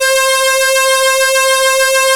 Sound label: ODY, ARP Odyssey